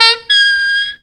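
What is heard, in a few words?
octave wail; Zero-G; Funky Elements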